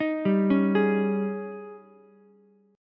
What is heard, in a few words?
D sharp 11th D sharp, Ukulele; arpeggiated; 8,7,9,11; JGuitar